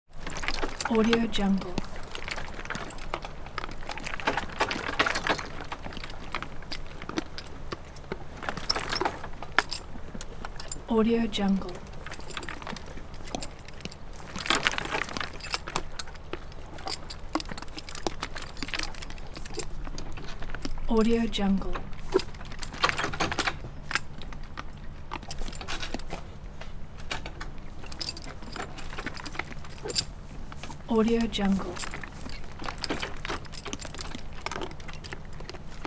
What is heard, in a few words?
horse drawn carriage idle with horse movement; hollywoodedge